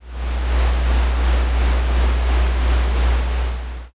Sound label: conveyor